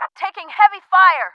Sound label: heavy